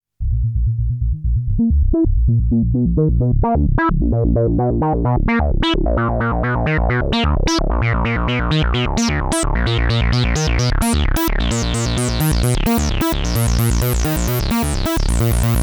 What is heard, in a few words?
Behringer Pro 800 Acid